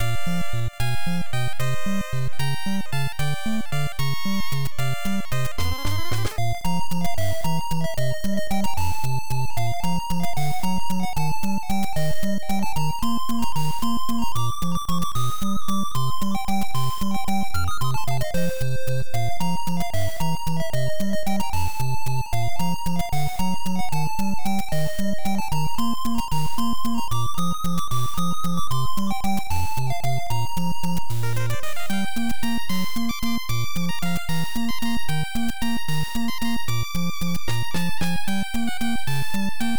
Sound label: Underwater Area; family computer; bio miracle bokutte upa